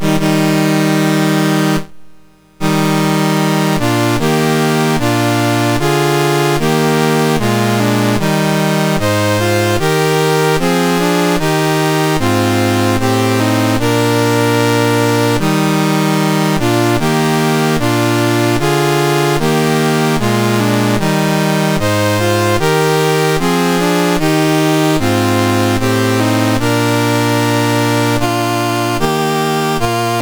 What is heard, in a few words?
MOS6581R2, National Anthems; Germany; Dave Marquis